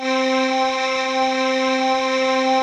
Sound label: FAIRLIGHT VOX; Rubber Chicken Software Sample Bank